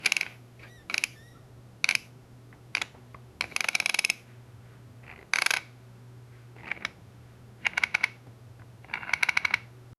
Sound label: creak creak the sound of, classroom